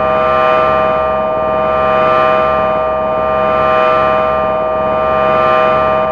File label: SF DRONE, NASTY RM FX; Zero-G Ambient 1